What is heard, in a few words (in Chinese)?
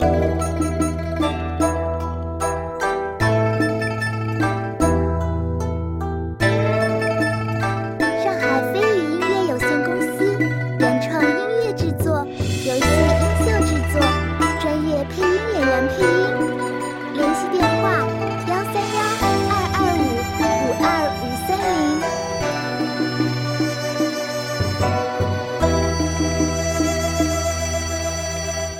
缓慢-节奏-剧情-中国风